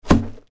bonus caber land; Highland Reels

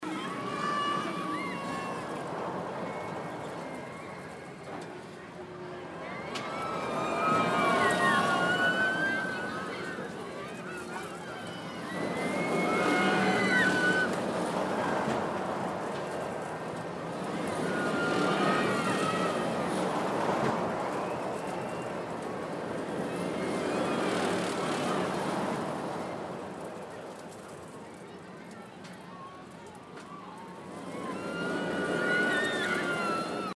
splash ambience; Fluffy Favourites Mix 'n' Win

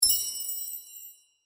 win chime; Eternal Wishes LuckyTap

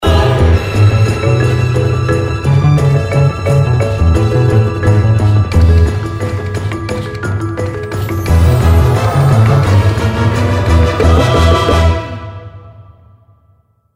feature Music; tiki-beats; eyecon

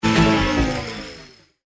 anticipation Wind Down; Dynamite Digger Eureka

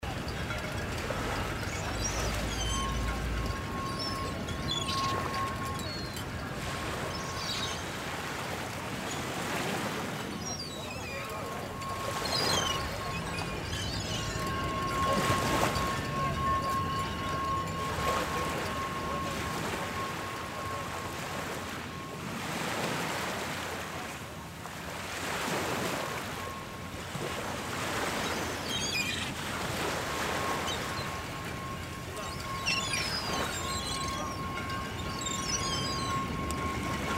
music background ambience harbour; Lucky's Fish and Chips

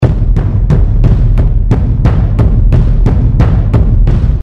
rhythm Win Loop; gold Totems Wiggle; tiki-beats; eyecon

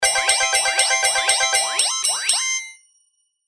three 7s alert; Step Back 7's

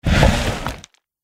selector slide in; Stampede Call of the Pride; Eyecon

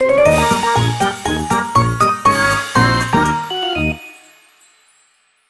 win super; GPAS Games; Irish LuckyTap; Eyecon

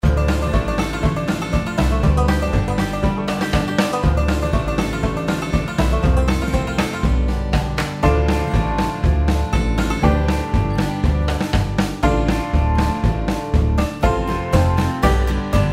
prize pick music; Dynamite Digger Eureka

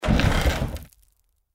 selector slide out; Stampede Call of the Pride; Eyecon

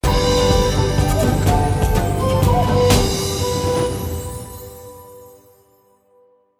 super win; Shamans Dream 2

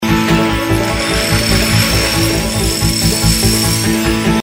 anticipation Loop; Dynamite Digger Eureka

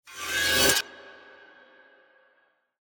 shield animation Reveal; Champions of Valhalla

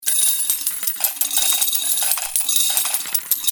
coins loop; Step Back 7's

